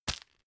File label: tissue grab hard; objekte; taschentuch